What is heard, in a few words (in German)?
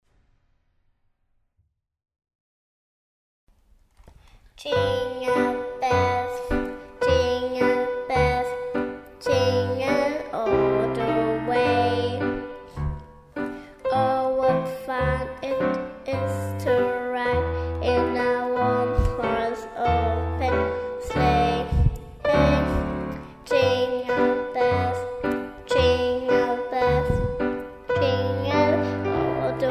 Jingle Bells mit Gesang